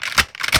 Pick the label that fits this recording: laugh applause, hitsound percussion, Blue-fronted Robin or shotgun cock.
shotgun cock